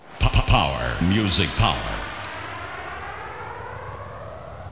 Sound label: Music Power, Radio Jingle